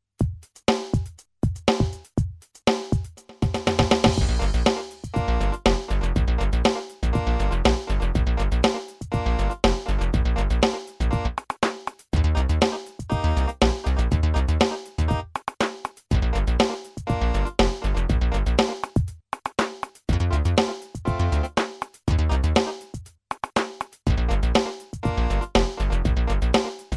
Bb Pitching game

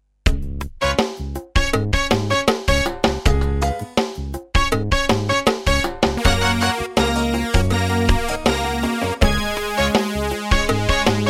2 Note Tunes Eb

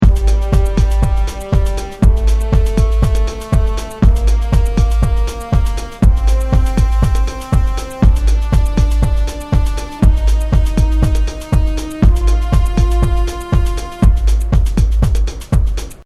ABCDEFG Bb

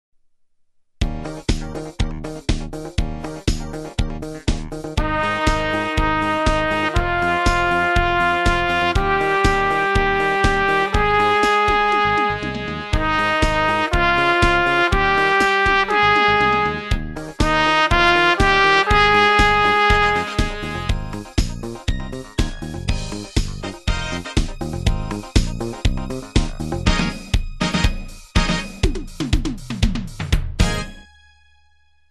Scale Up

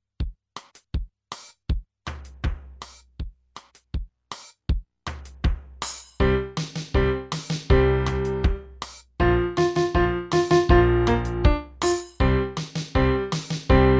Drunken Sailor, 80

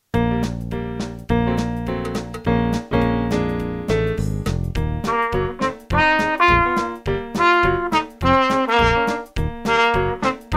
Jazz

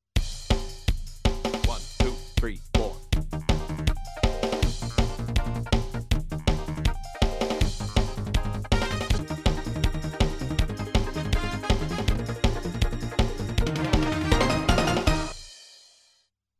tongue test Eb @ 160

